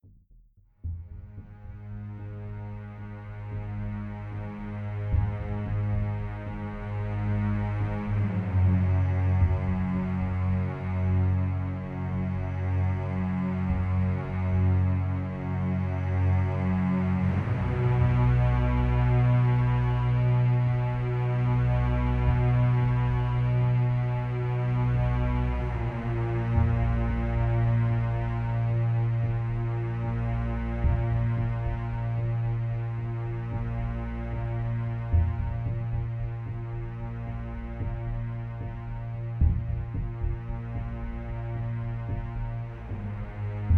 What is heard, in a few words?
Doom Underscore